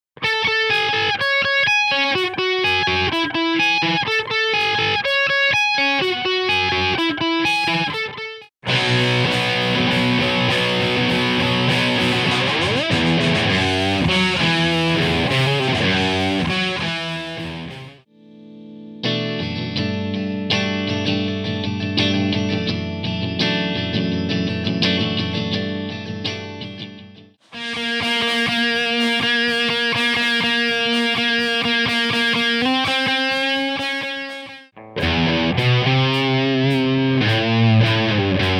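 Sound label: guitar example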